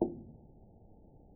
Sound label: soundbox IR w noise, clavinet